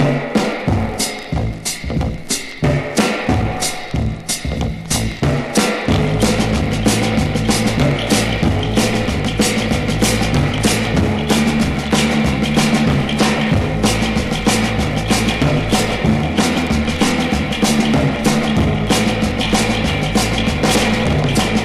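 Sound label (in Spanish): mambo; oldies-rhythm-blues-doo-wop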